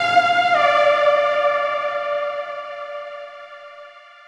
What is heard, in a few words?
NRG Lead, trance